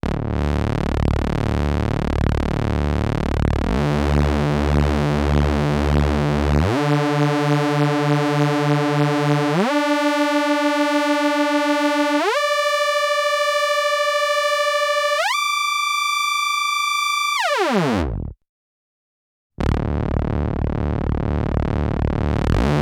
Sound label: Akai EWI4000s Fine vs Beat